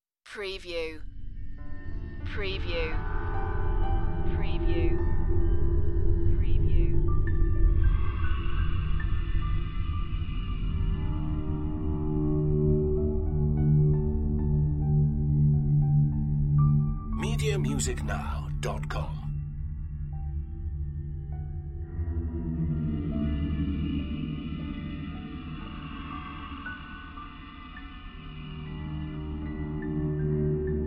Trailer Production Elements - Texture - Eerie, Foggy, Piano, Moans